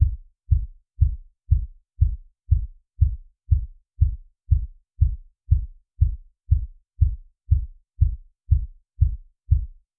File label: low heartbeat